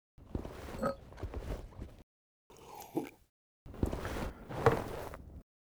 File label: Amanda Drinks